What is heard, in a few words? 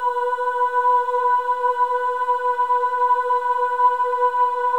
CHOIR AHS, AH B4; Spectrasonics; Symphony of Voices